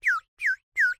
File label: Birdwhistle, clay, painted, water, airy, wispy, burst, 3 chirps, quick; Eiravaein Works; Vaeyan IV